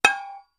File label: Metal Impacts, Dull; Dull Impact With Light Ring Out. - Dish Impact; pipes